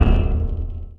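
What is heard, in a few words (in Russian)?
удар в железном бункере с эхом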